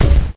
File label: CLUNK